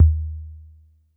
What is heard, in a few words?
F2 Analog Tom 1, Yamaha PSR-E323